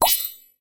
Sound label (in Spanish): SFX Powerup Cambiopregunta; trivia crack